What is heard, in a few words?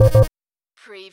Servo Panel Sound - Keypad